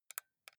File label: console controller xbox joystick press slow